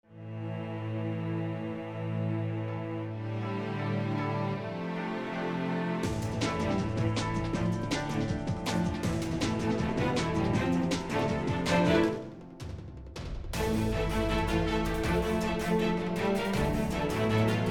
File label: string speed